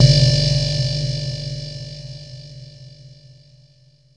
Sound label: Cymbal, Nord Modular Drum Samples; Yawgmoth